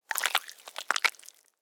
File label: Alien Creature, Scifi, Alien, Creature, Movement, Insect, Science, Fiction; Aliens; BlastWave